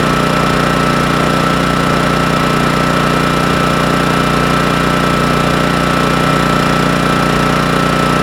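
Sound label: engine portable generator; Call of Duty Modern Warfare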